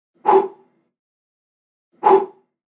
Vydoh rezkii pered vypivkoi

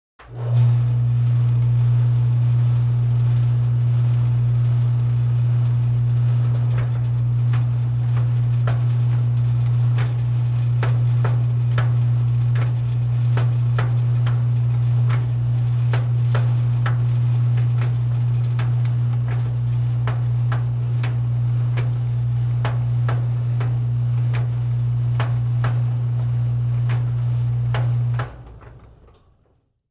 Stiralnaya mashina

